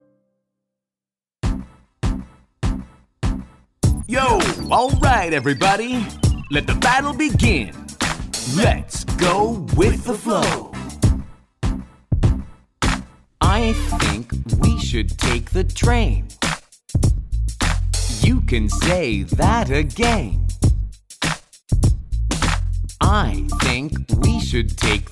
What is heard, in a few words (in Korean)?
영어남 챈트 Rap 스타일; 남자